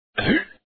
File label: land; logika